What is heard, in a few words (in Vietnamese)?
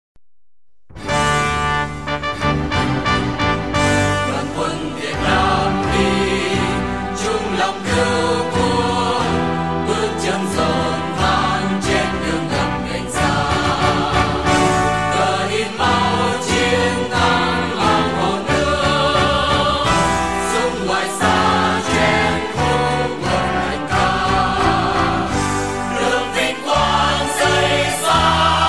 Quoc Ca Viet Nam Chuan Nhat Nhac Co Loi Hat